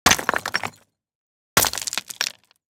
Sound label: smash rock on debris; Sonniss GDC